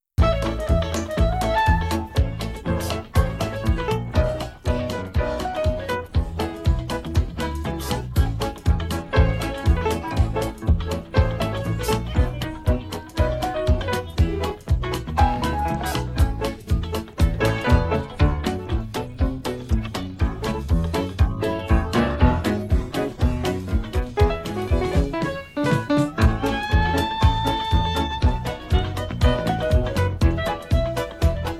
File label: im so happy music